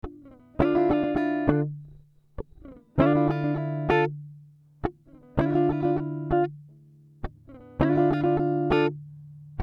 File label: Dm 100 GTR Riffs